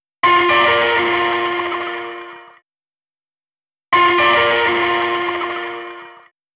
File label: Synths and Organ; Harmonic